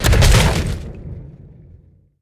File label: terminal explo